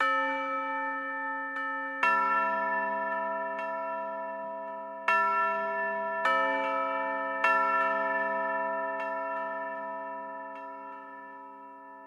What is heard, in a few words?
bells drone; echossaise